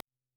digital desync crackle

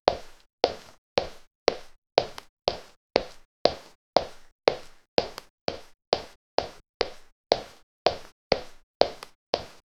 single human footstep, boots; sound effect

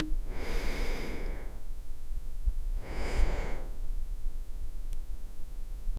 room tone subdued

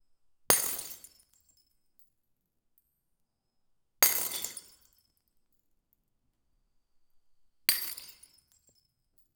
fragments of glass slowly

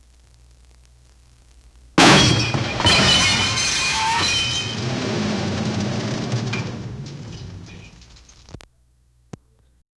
vintage metal car crash w

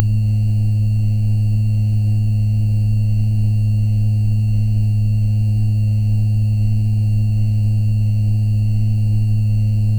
realistic buzzing vibrati, mobile phone

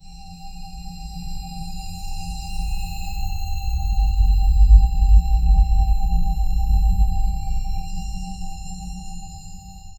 the sound of a crystal, absorb

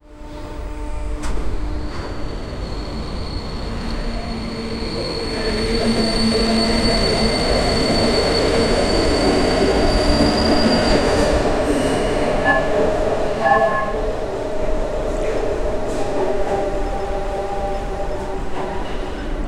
train slowing down brakin; braking

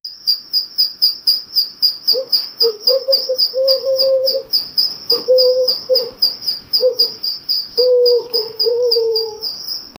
taps being sung by a, chicken

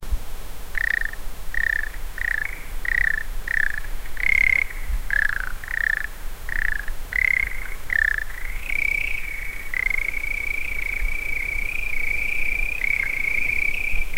winter in forrest, bird calls

